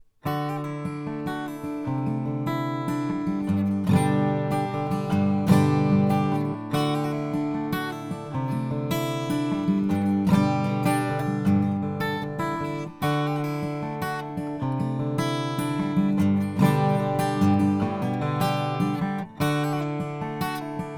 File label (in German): VT-67 Gitarre; fame pro series